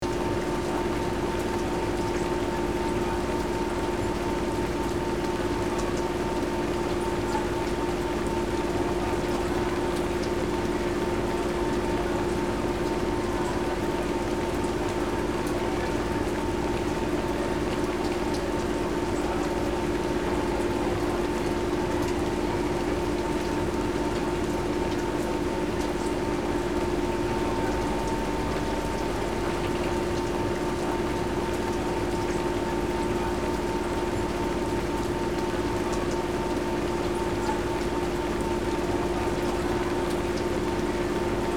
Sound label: Rainy station industrial hum light rain loop